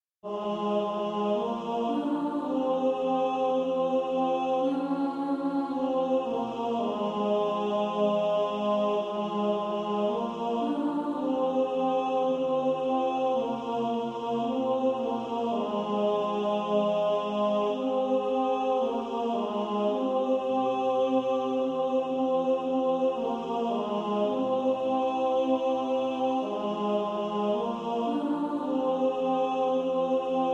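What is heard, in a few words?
tenor, practice music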